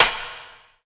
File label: A#3 HOLOW, JAPANI PERC; Northstar; The Wizard VOL-1